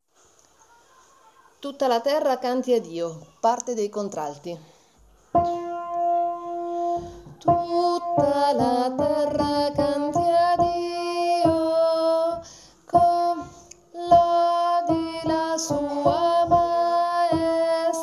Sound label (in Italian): Tutta la terra canti a Dio contralti